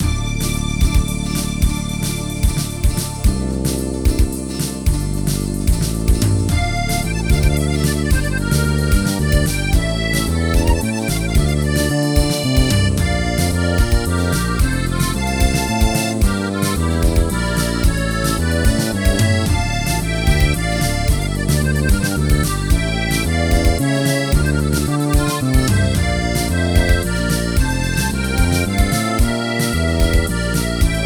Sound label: driving france